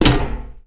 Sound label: land metal, FootStep; WarRock